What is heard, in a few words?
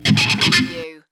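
Distorted Guitar Scratches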